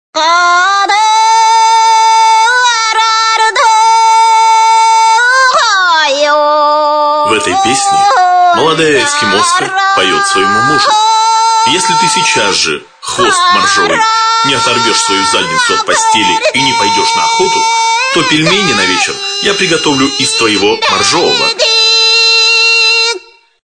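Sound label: eskimo alarm clock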